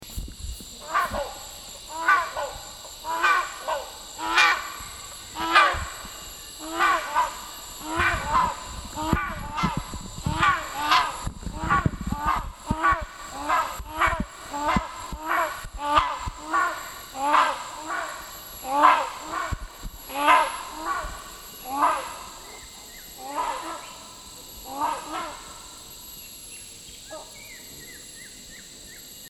Rhinoceros Hornbill Buceros rhinoceros borneoensis; tropical birdsong